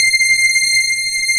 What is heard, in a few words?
JUP6 BUZZ, Jupiter Synths; E-MU Formula 4000 Series Vol. 3; Analog Odyssey